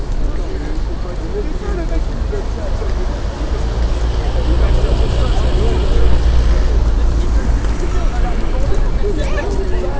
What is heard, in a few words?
interferer